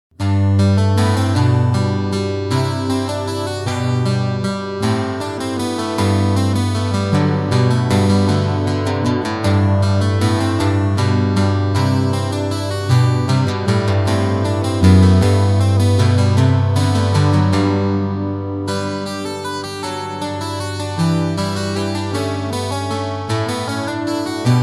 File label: Minuet In G